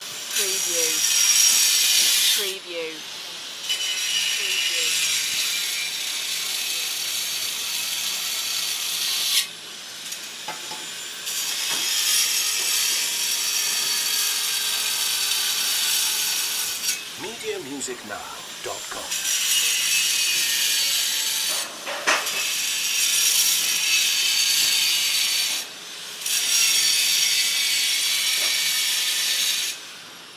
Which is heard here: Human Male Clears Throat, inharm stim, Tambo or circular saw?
circular saw